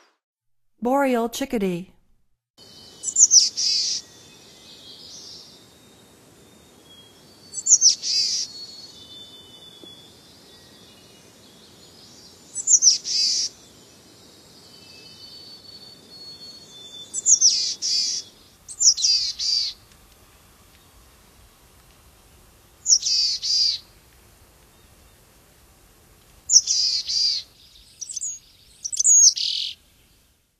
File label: Boreal Chickadee, Songs of Birds of North America; Stokes